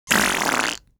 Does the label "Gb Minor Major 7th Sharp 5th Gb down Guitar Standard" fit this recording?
No